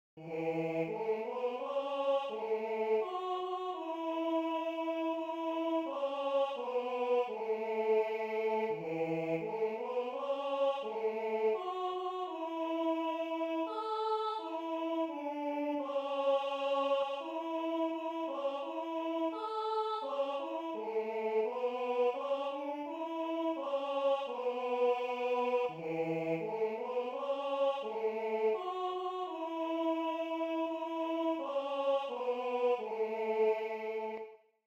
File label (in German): Jetzt fangen wir zum Singen an Tenor I